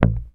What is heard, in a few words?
HOF X NOTE, Hofner bass; Sampleheads; Will Lee Bass Library; HOFNER SUS